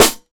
dry snare sample a sharp key, acoustic snare tuned to the A# note